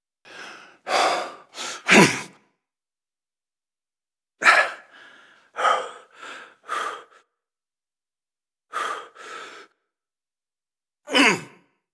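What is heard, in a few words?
chase fighter ship; Modern Warfare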